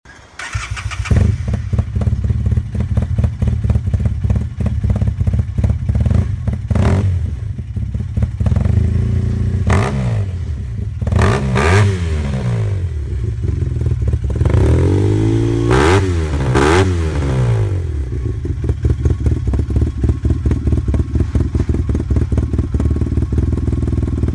arctic cat wildcat sport, performance; hmf

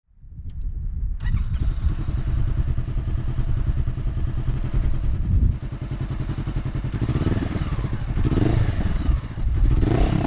cbr500 sound

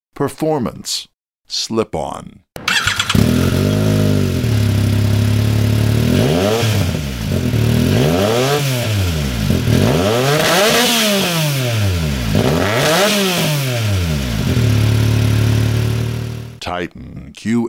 yamaha yxz 1000r sound

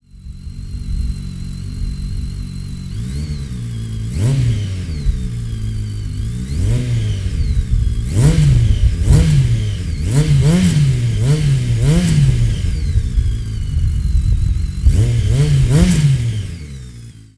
k1300s sound test

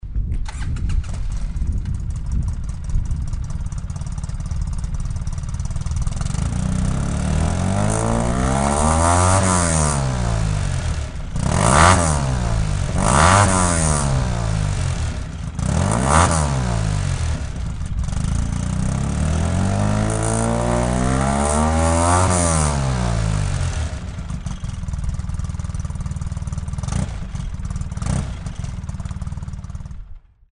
sportsman 500

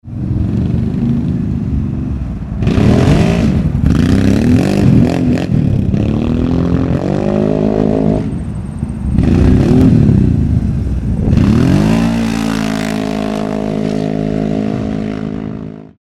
ac thundercat 1000 sound, exhausts; arctic-cat; pipes; 08-10

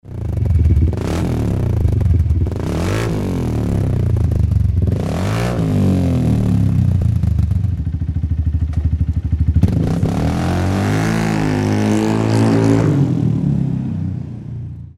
mongoose300st

